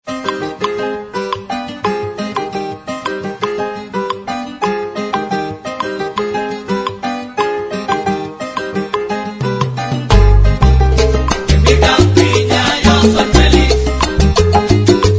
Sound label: Son Cubano